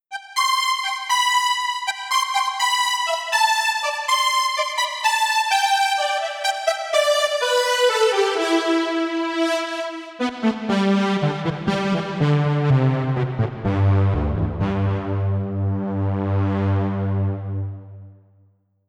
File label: trumpet